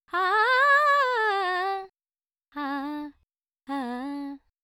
Dry 4-4 G 100, Vocals Male

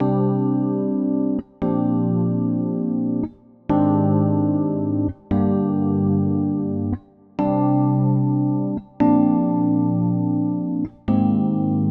Rhodes Vibey Chords Cm 130; Sample Banks; Melody; Keyboards

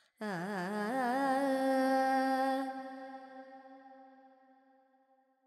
FX, Vocals, Carnatic Female, Alaps, Charukesi

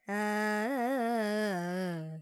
Pentatonic, Vocals, Carnatic Female, Alaps, Dry; 70 G; Sample Banks

